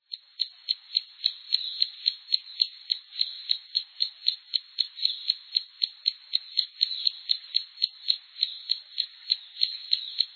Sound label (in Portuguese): tesoura-de-fronte-violeta